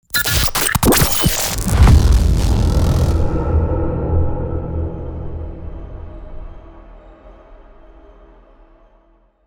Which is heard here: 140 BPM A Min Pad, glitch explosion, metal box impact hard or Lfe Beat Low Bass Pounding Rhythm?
glitch explosion